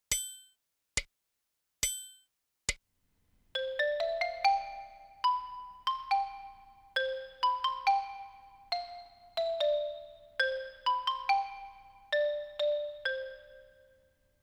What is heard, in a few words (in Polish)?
dyktando rytmiczne